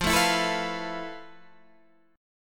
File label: F Major Sharp 11th Guitar DADGAD, chord; down; chord shape